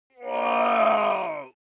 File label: human vocal male groan painful